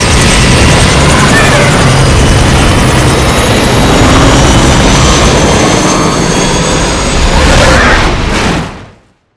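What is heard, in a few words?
helicopter blackhawk mono crash; Explosions; Call of Duty Modern Warfare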